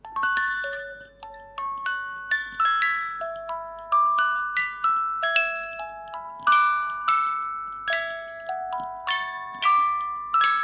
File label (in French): melodie boite a musique